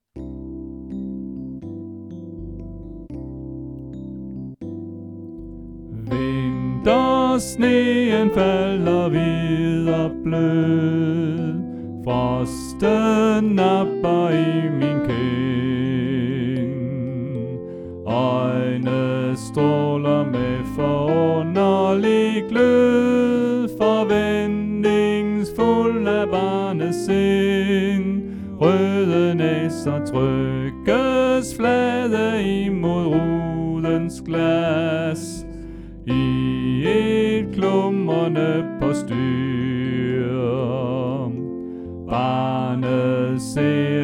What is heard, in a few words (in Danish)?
Sopran; Glædelig jul; Stephans-koret; oevefiler